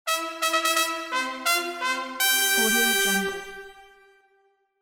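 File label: trumpet fanfare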